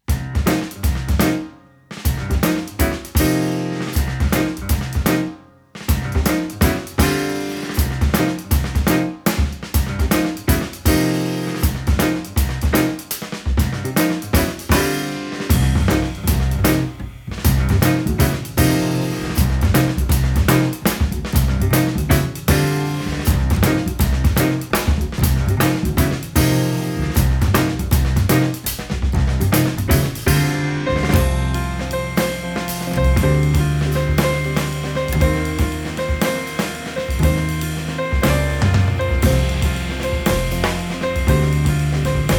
groove interlude

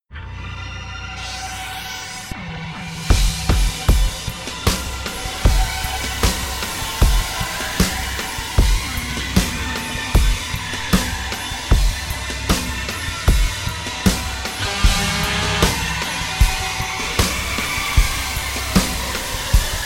shot; halfspeed

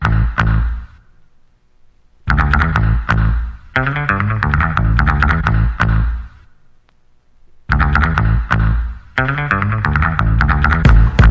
motion instrumental

